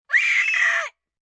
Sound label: Scream Wail, Scream, 1 child, short, scared, human voice, kid; Screaming Sound Effects; Sound Effects:Voice; VoiceBox